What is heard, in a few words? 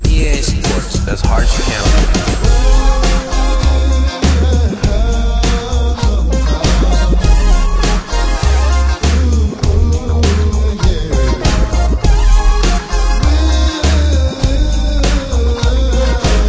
Menu Loop